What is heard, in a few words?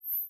short whoosh